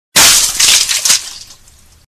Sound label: Blood Curdling